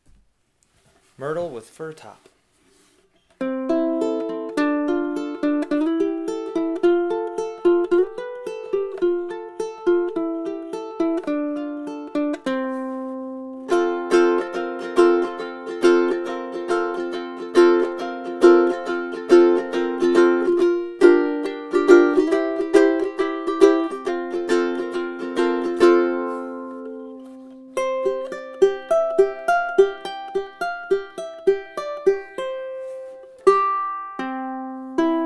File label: black Stripe Walnut Fir Top, ukuleles